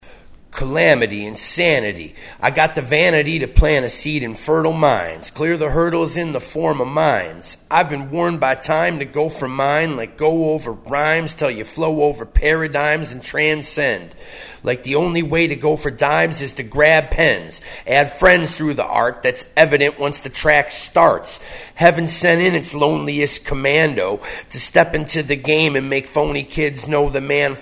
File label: vox, acapellas